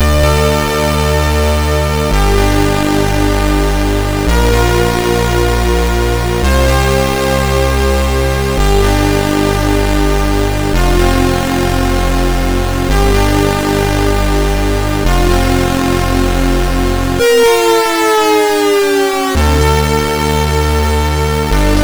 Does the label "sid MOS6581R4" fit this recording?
Yes